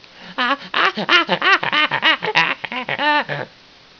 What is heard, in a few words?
alert; svencoop; aslave